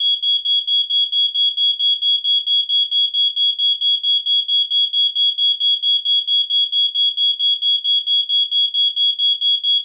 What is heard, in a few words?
Smoke detector alarm